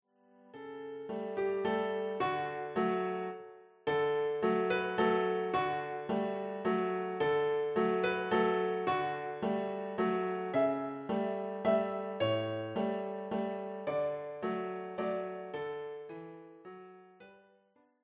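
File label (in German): Klavier; Stille Nacht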